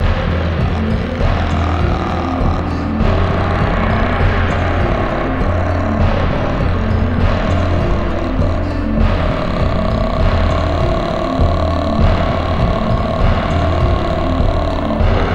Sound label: Battle Mongolian Without Guitars; Real Warfare 2 Northern Crusades